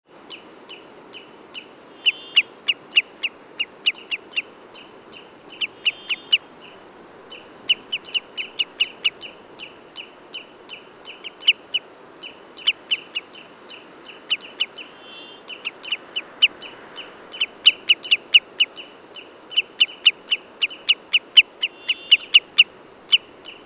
chevalier aboyeur appel

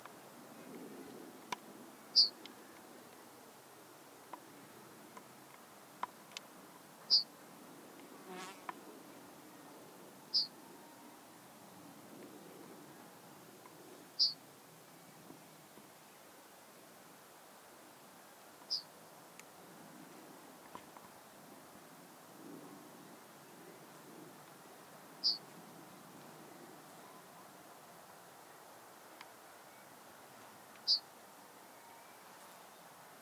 bruant jaune appel

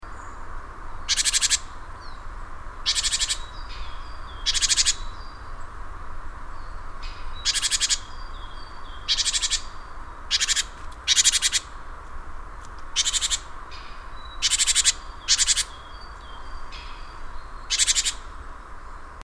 mesange charbonniere appel

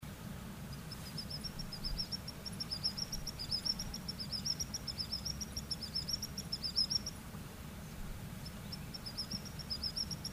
chevalier guignette chant

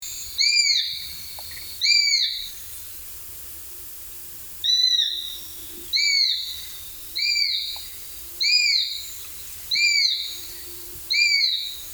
epervier appel

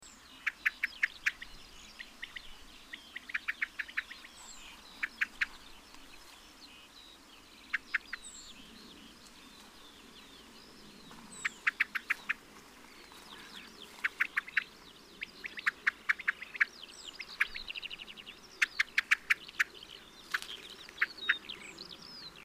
merle appel

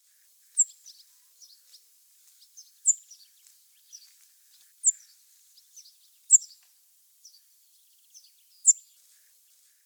bruant zizi appel

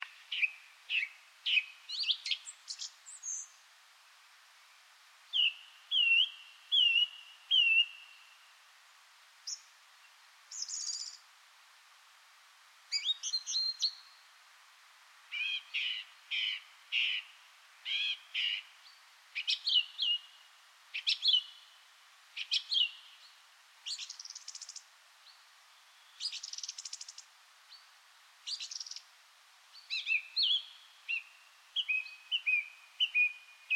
grive musicienne chant

